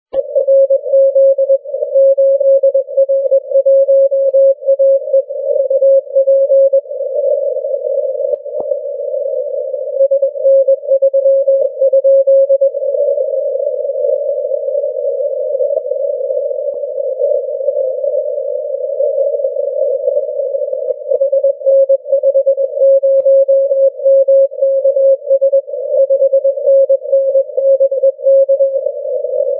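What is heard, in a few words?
80m continuous wave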